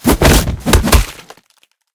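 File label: double kick to the face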